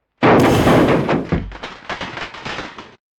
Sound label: break metal